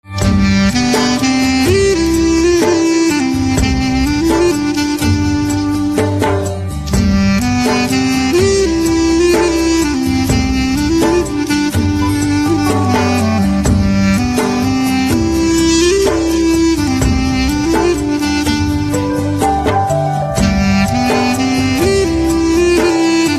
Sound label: Saxophone Instrumental Ringtone